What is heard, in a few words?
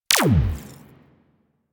Amp Barrel Tech, Weapons; Warframe; Operators; Amplifiers; Amp Set 2